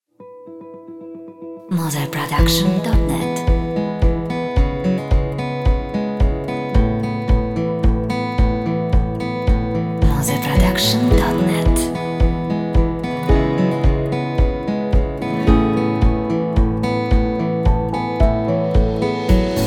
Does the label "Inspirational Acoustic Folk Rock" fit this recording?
Yes